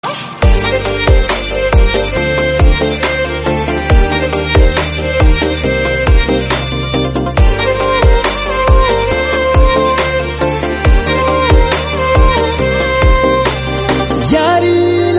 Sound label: instrumental